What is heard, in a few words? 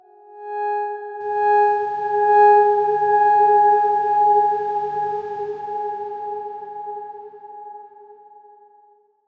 Darkswarm G#4 pp; HSS Ableton Pack1